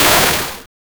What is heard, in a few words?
pixel art attack